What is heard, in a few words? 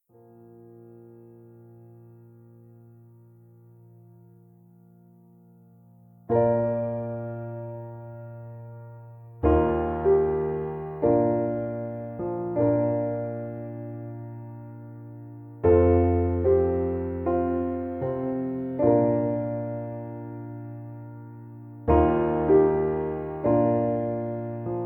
PIANO; Pony Fan Music Remix Stems; 154BPM